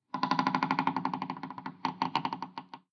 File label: wood creak; adams venture origins